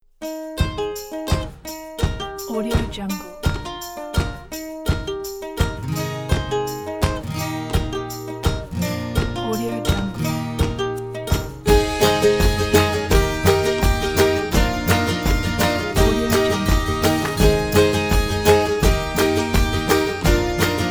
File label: ukulele live; nikoevans